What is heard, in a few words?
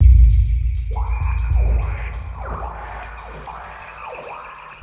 Ambi Groove